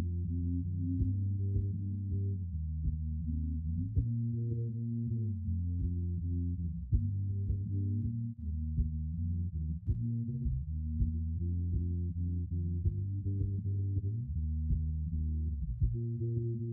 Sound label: bass; SiSEC11